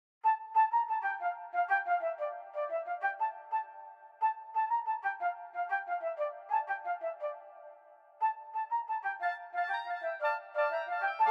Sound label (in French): orchestre symphonique